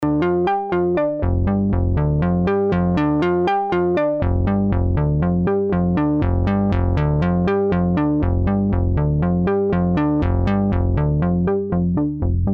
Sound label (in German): Sequenzerlauf